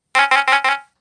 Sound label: Claps